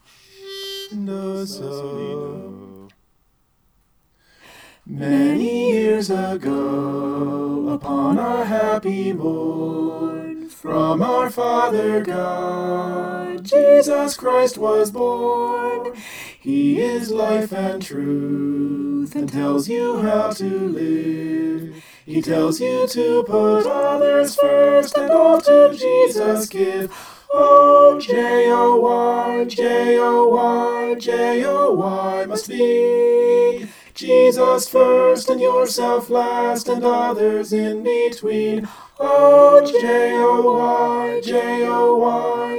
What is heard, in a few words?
christmas j-o-y soprano